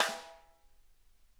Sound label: rim hi R, snare; Sonic Reality; Interactive Drum Kits